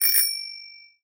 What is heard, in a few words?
Postman Default E-mail; Samsung Galaxy Pop India; notifications